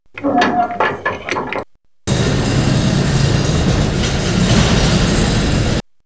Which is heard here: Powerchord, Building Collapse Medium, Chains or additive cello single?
Chains